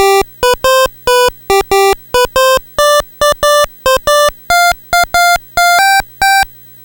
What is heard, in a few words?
NES Synth, chiptune; Sonic Foundry; Nintendo Entertainment System